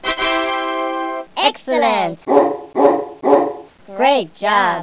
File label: DOG